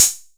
Bass Closed Hat, Jomox Airbase99, Drum Machines

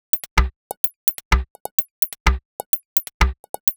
Sardine Top G# 127bpm, House Drumloops; 90 s Sample CDs; Zero-G House Fabrik Drumloops; ZG Drumloops

